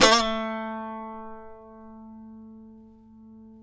SAZ A 3; Spectrasonics; Hans Zimmer Guitars VOL-2

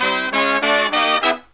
SLEEP, Killer Brass Riffs

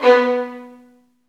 STR VNB2, Vlns Marc; Roland L-CD702; Vlns Marc1-3

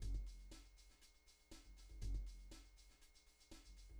Noise Beat; Drums & Drones

